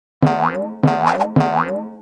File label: clown bounce; kids-tetris; 2Players